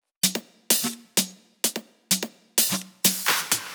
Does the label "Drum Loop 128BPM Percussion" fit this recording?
Yes